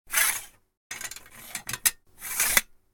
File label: metal